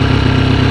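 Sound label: Engines low loop; SuperBike2001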